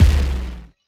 Bomb Explode

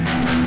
Electric Guitar Soft

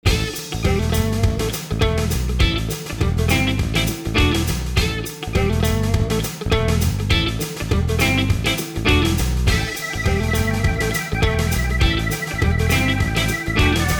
Rockabilly; Puck Off

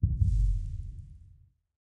Meteor Land In Factory; Lethal Company

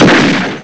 Bang

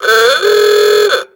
Klaxon; Orphaned

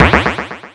Spike Bomb Bounce; SpikeBomb SFX Bounce 01

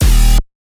Bass Tech Phat 160 D#; exciplex sample presets pack; JinoBeats

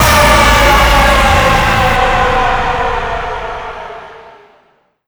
GameOver; Tippin Bloks; TUNE Bloxx GameOver

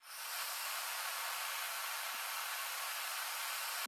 Fire Extinguisher Loop

